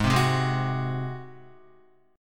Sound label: Ab 7th Ab Guitar 4F,4A,5C,5G,5C,6E; Ab7 strummed; JGuitar